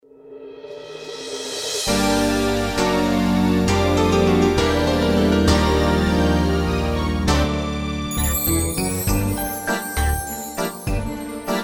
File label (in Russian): karaoke versija